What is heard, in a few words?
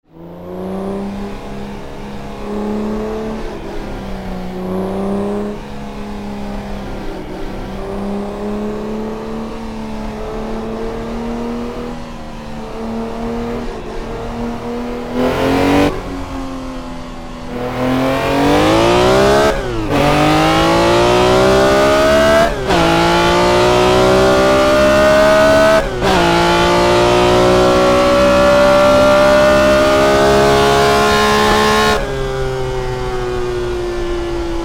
BMW M1